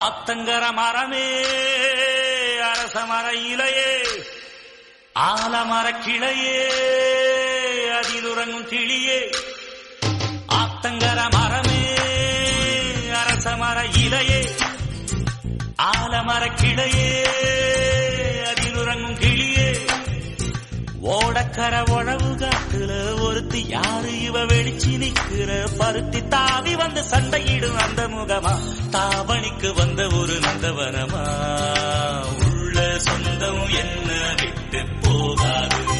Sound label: Tamil Song Ringtone; Kizhakku Cheemayile